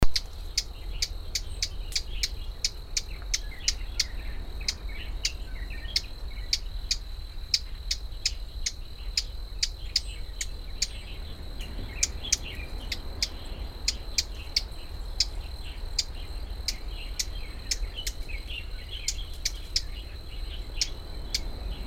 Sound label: warbler brown flanked bush Horornis fortipes; Craig Brelsford and Elaine Du